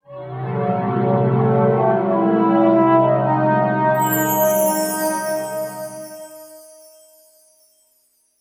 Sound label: Music; FMV